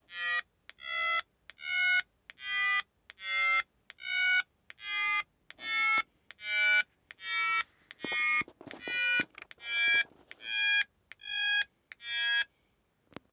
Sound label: DTMF sample